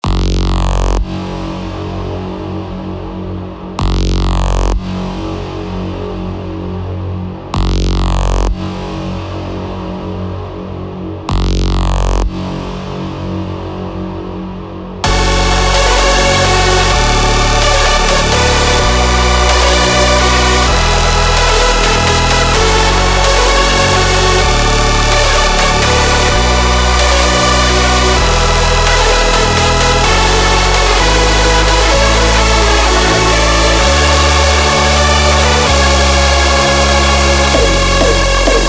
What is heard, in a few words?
EDM Remix